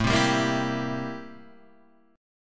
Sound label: A-Minor 7th Flat 9th, chord; A-7b9 Chord {5 3 5 3 5 3} strummed